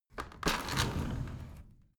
window roller shutter open soft single pull

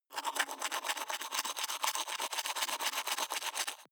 teeth brush wet open inward

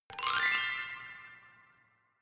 notification glockenspiel sparkle slide up soft

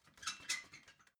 ladder metal handling soft

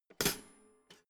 toaster pull up hard

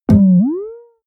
boing

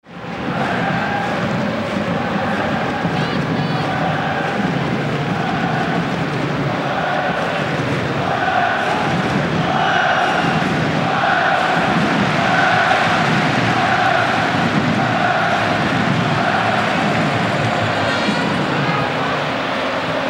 soccer fans cheering

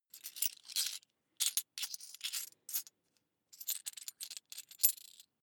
coins big search long

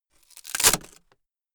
wood splinter low

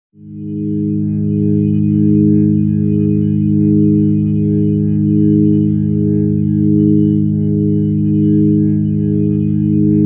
organ chord neutral g sharp

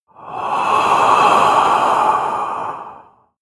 inhale male

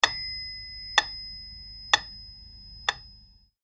metronome

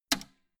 old switch harsh snap hard

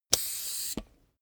soccer pull out pump

